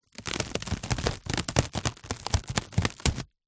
household cling film wrap stretched hand fingers rub across